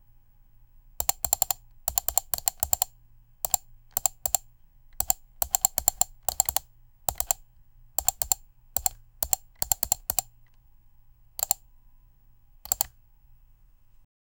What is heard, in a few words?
continuous fast mouse cli